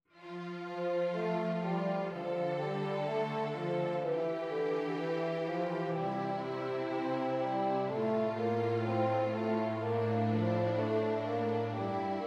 esercizi di contrappunto semplice A tre voci; 3 gattung; Ronge

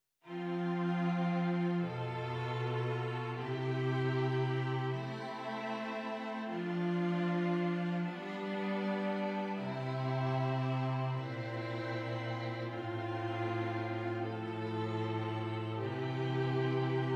esercizi di contrappunto semplice A tre voci

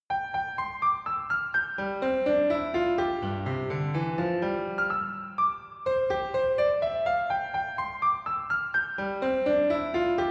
Abbozzo di una sonata in la maggiore per pianoforte; Quaderno Kessler

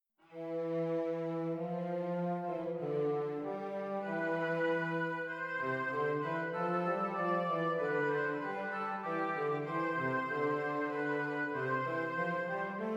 Orchestrazione; Ronge; Gerd Prengel

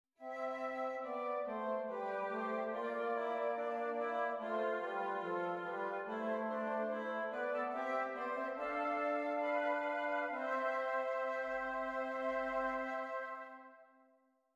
esercizi di doppio contrappunto nella decima; Originale Beethoven

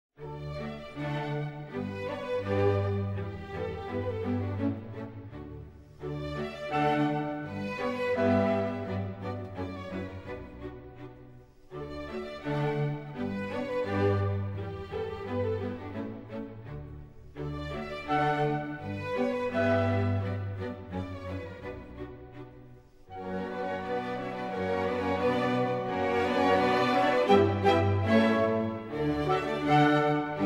Deutscher Gesang, 2 clarinetti corni e archi; Canto tedesco

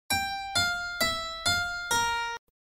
Tema e risposta

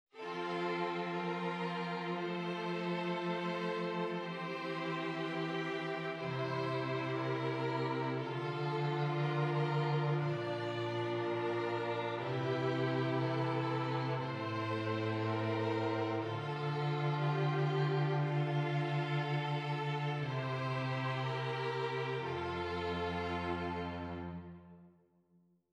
esercizi di contrappunto semplice A quattro voci; Ronge

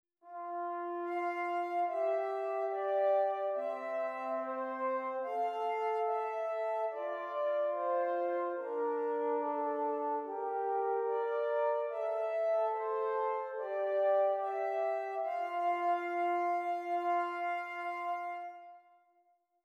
Esercizi di contrappunto a due voci; Zweite Gattung

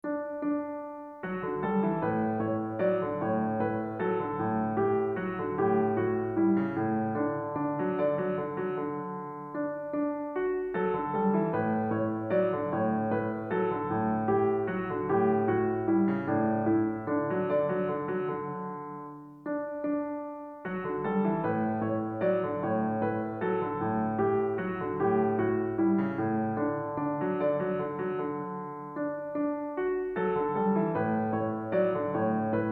Canto irlandese sconosciuto riduzione per pianoforte

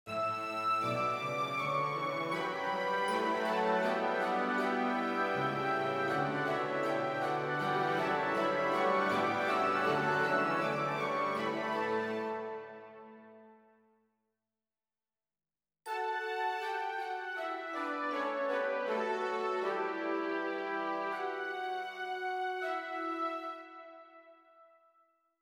Doppia Fuga

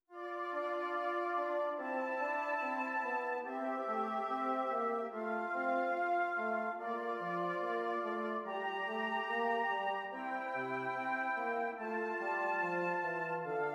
Esercizi di contrappunto a tre voci; Dritte Gattung; Nottebohm

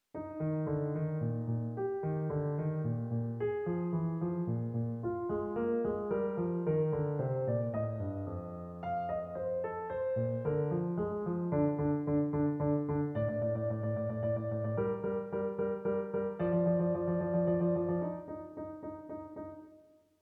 sinfonia

